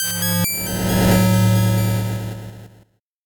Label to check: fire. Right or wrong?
Wrong